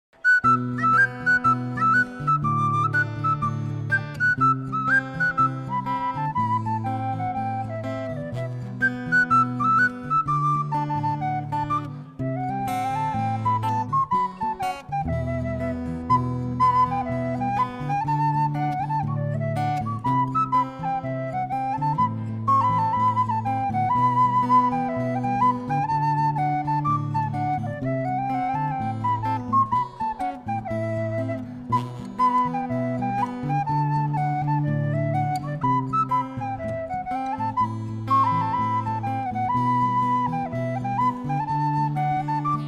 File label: reels